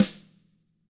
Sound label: TAMA SNRD HIT; ILIO; Double Platinum Drums 2